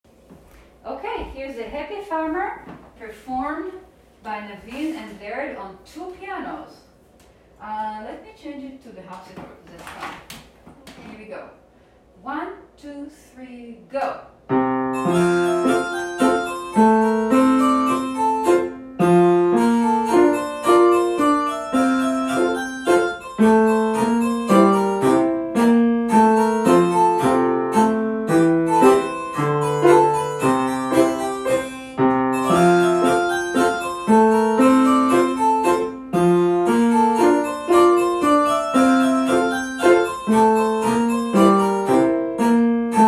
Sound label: 2 pianos, duet; Happy farmer